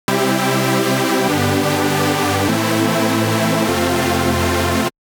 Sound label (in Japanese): シンセ